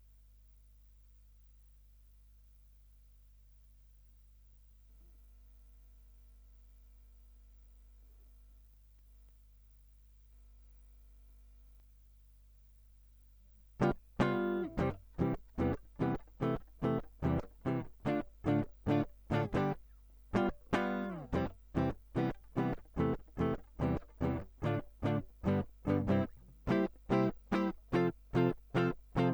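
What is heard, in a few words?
Guitar Line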